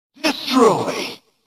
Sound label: Destroy